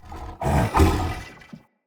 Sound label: Corrupted Battle Dog Attack Breathe Snarl Active Walk; Animals; Vocals